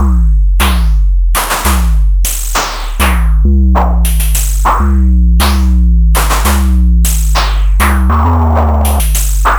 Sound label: Money Groove, Beats